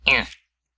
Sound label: Hurt, human male villager; customnpcs